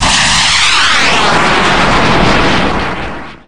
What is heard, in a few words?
nebel launch; garrysmod; gbombs